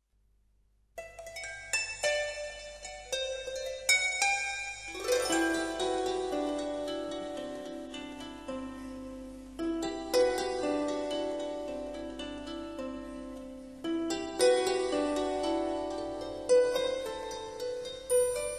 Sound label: Strings; Scandinavia